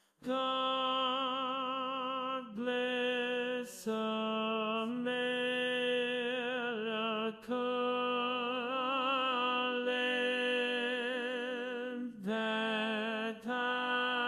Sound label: tenor 1, chorus